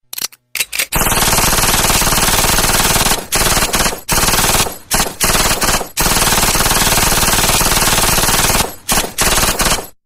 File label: mini uzi sound